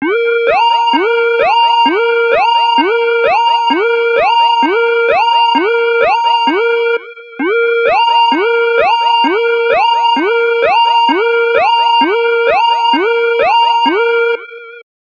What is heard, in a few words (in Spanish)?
Alarma!; Sony Ericsson; Z780i; PlusFourSix; Lars Edvall